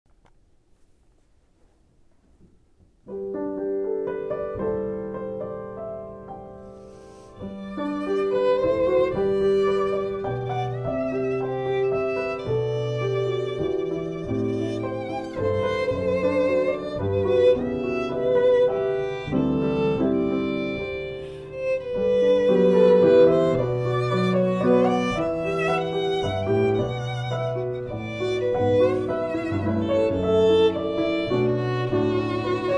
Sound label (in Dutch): Lullaby, Viool en piano